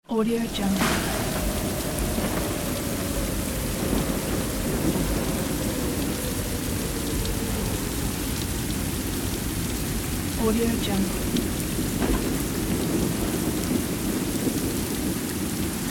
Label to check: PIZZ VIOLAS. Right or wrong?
Wrong